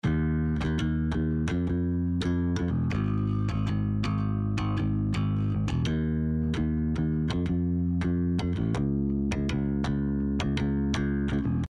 bass after; Rvox

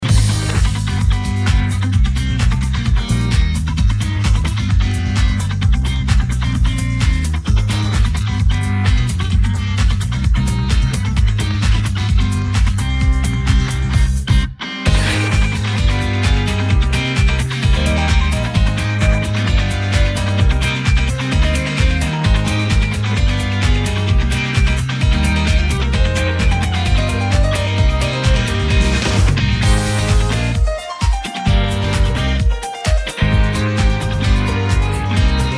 no vocals

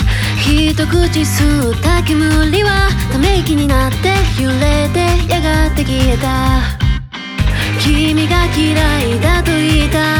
Bass A

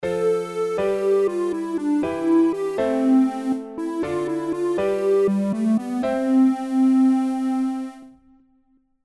chord

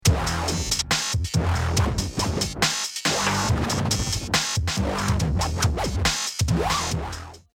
synth

